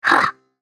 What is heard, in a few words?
effort; BadRobotAmumu